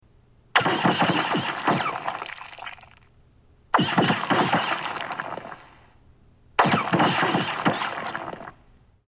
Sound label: trevolo game mode, monitor; BenQ 2.1聲道喇叭